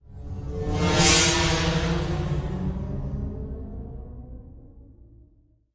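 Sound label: Wsh, whoosh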